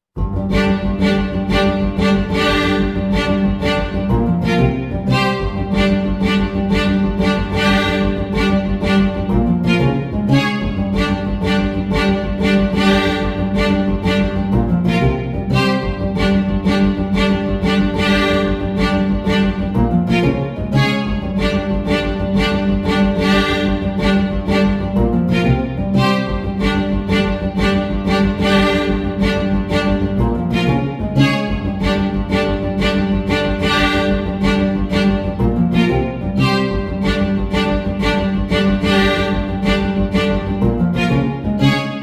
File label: Violin; Undertale